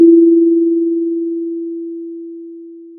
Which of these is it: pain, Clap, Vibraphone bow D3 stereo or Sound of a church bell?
Sound of a church bell